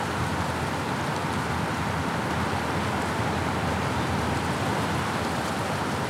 desert med wind; ambient; atmosphere

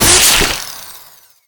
manhack slice flesh; npc; Half Life Alyx

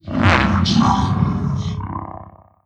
dry, strider idle; creatures; combat; vox

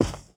carpet, footsteps; player

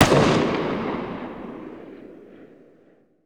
m4a1 distant, weapons; Counter Strike Model Pack; CS:GO

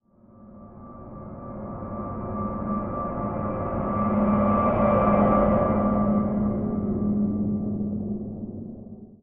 bunker; ambient; Counter Strike Model Pack; CS:GO; survival

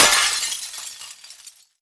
break glass phys medium; physics; breaking

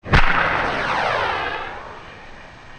portal beam shoot; Half Life Model Pack; Half Life 2; ambient levels citadel

